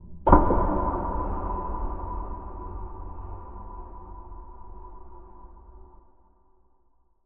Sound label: Cinematic Metallic Hit, Boom, Trailer, Sub; Cinematic Hits & Impacts; Jake Fielding; Haunted Metal Vol.1